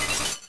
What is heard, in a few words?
ice break; P3D-Legacy; 2.5DHero